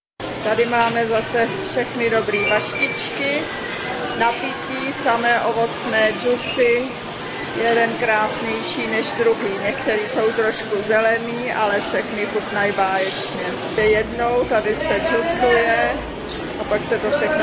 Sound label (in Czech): trh juice, Quito; Video Ekvador